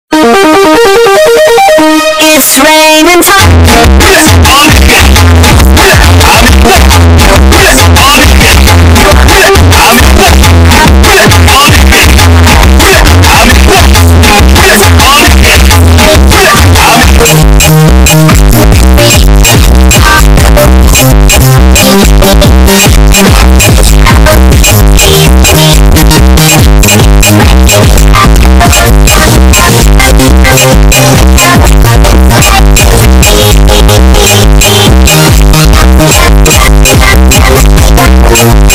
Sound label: brazilian remix funk